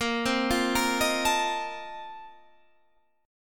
Bb Major 7th Suspended 2nd Suspended 4th, Guitar, Standard; 18,15,15,15,16,17; arpeggiated